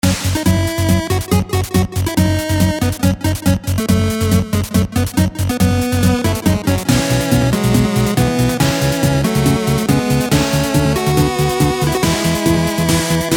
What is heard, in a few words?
8bit space shooter